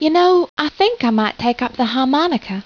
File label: harmonica; wanted